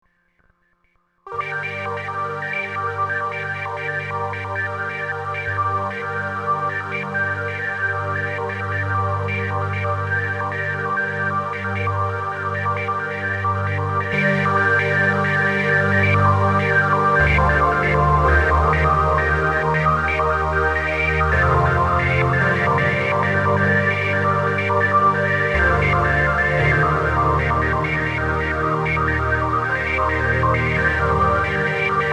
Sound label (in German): Filter Nanozwerg Filter SH Effekte